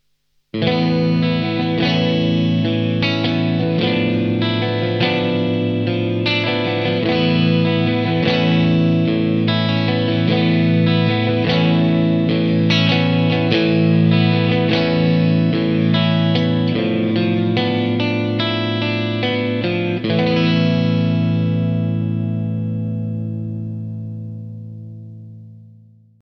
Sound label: Maxon PAC9